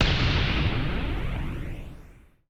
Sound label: comb filter